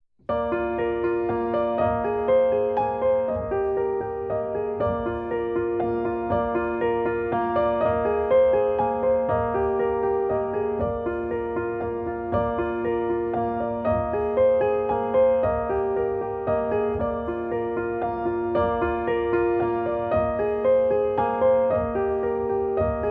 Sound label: Magic Lullaby (Instrumental)